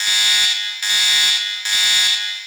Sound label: Elevator Alarm Loop; Cinematics; Veso Intro